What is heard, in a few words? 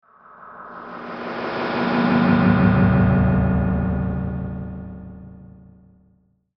Whoosh Metallic Ring Delay; Blastwave